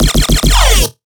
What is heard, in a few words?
Riddim Bass Hit F#; One-Shots; Bass Hits; Swarm; Ghosthack; Riddim Essentials Volume 2